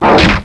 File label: miss